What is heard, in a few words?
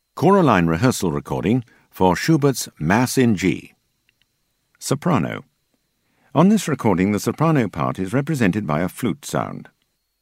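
Mass G Soprano; Schubert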